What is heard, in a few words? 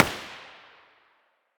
muzzle g36 mono far, weapons